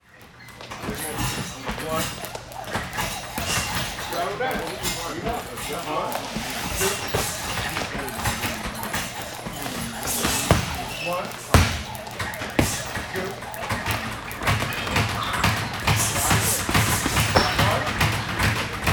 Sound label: sport boxing gym ambience people punch bags speedball skipping ropes; felix blume